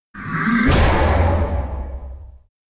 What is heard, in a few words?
Final Boss Stinger